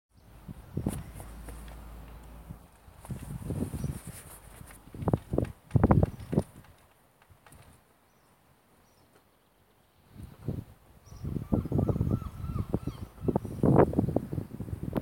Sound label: Sounds of St Andrews Cathedral, flags flapping